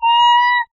Kitten, cartoon Foley Fx; musicradar; cartoon caper

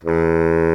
BARI SAX E2; Greytsounds VOL-1

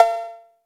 Syn Cow; Roland R-70; Perc

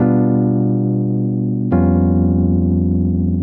El Piano 140 F, jazz keys, Chordy Loops, 140bpm

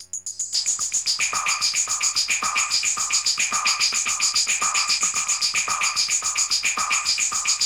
S+H HAT 120; AMG; Global Trance Mission VOL-2; PROCESEDLPS

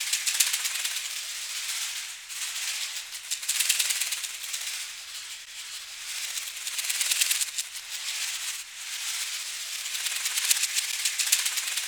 ST PER A, SHAKER FX; Spectrasonics; Heart of Africa 1

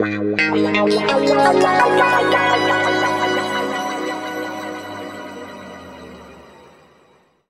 G# P-S WA -R, Guitar Samples; East West; Steve Stevens Guitar Samples Collection; PTCH-SHFT+WA

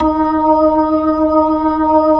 B-3; Jazz; Roland LCDP10 Keys of the 60s and 70s 2

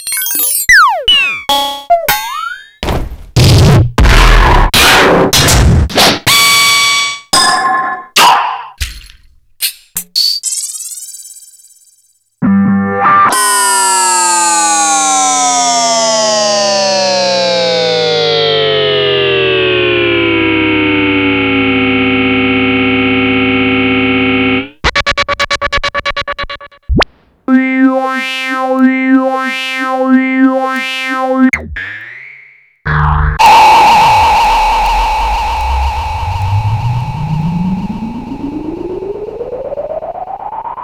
Roland JX-3051

